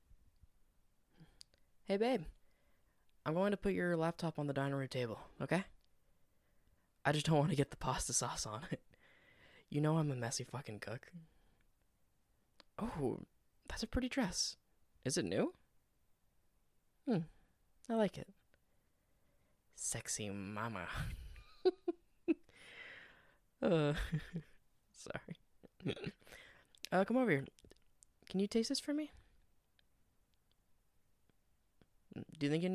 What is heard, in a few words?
Wet Sfx; Parsley Patreon Reward